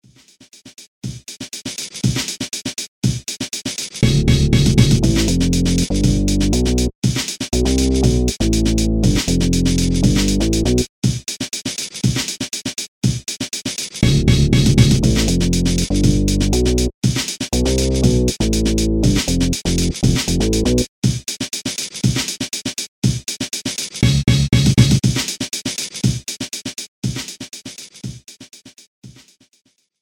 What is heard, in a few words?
boring bass